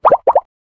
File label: a short silly cartoon sound, silly